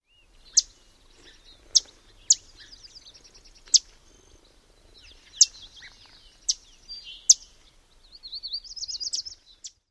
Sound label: alarm chips